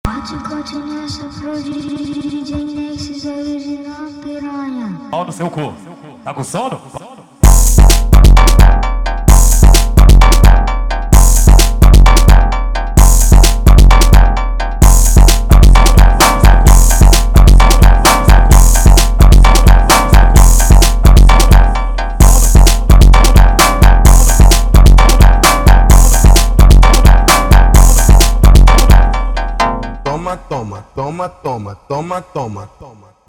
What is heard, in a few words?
808 Relâmpago, funk